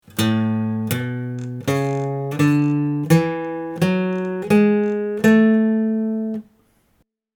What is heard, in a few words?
A major scale 1 octave